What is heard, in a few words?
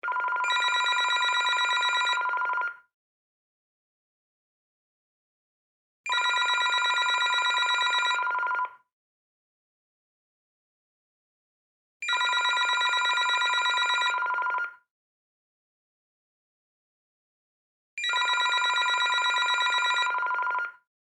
Technology Telephone Ring Cordless; Telephones; Electronics